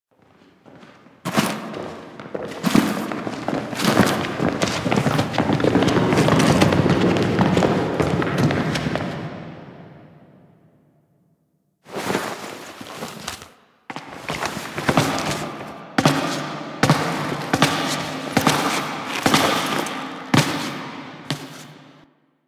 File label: Foley Group Enter Sit Table; Warframe; Parvos Lich Intro